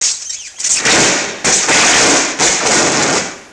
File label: zap, debris; XDM